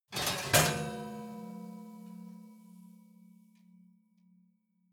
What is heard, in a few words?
oven baking sheet draw out